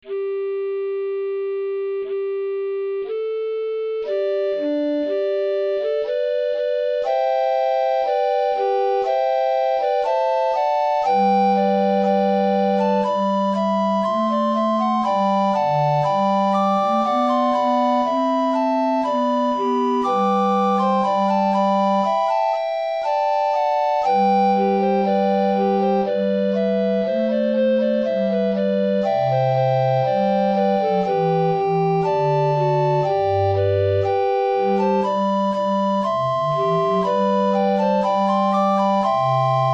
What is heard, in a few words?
recorder quartet